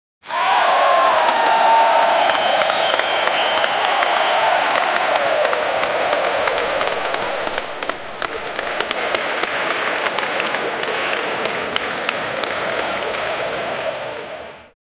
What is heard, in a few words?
the sound of an ovation, audience